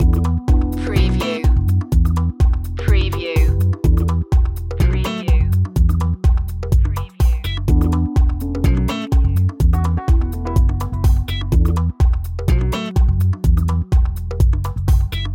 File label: calypso loop, chill